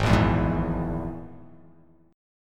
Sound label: Ab Suspended 2nd Sharp 5th Ab; strummed; 4,1,2,1,4,x,x,x; JGuitar